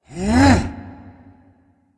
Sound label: grunt; smite; geb